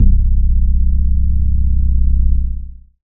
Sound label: bass C, Sub Bass, Dance Synths; ProSamples vol.37